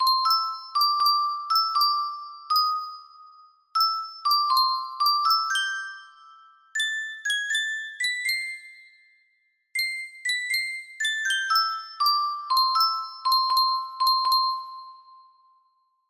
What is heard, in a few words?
irish lullaby, music box